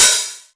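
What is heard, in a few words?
Hat Open, Novation Drumstation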